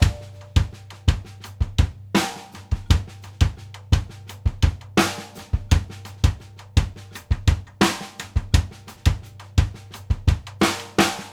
RIM + SNAR, 84; Sampleheads; New York City Drumworks VOL-1; SP ORLNS 84

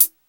HAT

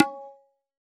Tabla Baya Tin; Roland BK-5; Percussion

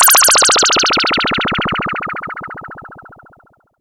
SYNTH FX

